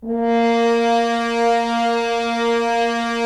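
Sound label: Solo French Horn, brass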